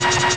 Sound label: bzzz; Dragon's Lair